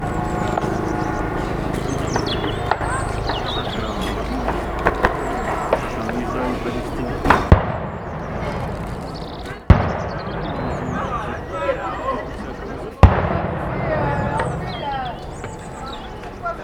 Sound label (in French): explosions; quotidien sonor